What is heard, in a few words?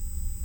Hydrophone